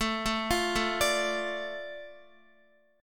A Suspended 4th Sharp 5th A Guitar; Asus4#5 arpeggiated; JGuitar

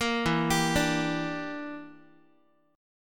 E Minor 7th Flat 5th E, Guitar; arpeggiated

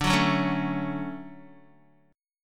D Major 7th, Guitar; strummed; JGuitar

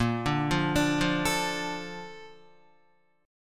Bb Major 7th, Guitar; BbM7 arpeggiated; JGuitar

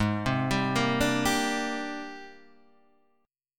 Ab Major Sharp 11th Ab

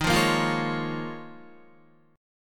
Eb 7th Suspended 2nd Suspended 4th down Guitar; Eb7sus2sus4 strummed; JGuitar

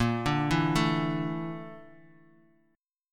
Bb Major 7th Flat 5th Bb Bass Standard; BbM7b5 arpeggiated; JGuitar

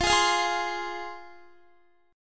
F Minor, down, Guitar; strummed; tuning 4F,4A,5C,5G,5C,6E

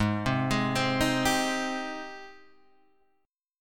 Ab Major 7th Ab down Guitar Standard; AbM7 arpeggiated; JGuitar

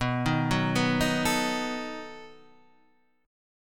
B 7th B down Guitar Half Step Down

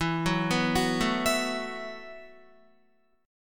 E Suspended 2nd Suspended 4th E, down, Guitar; Esus2sus4; arpeggiated

